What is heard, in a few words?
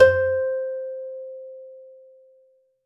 studio quality, harp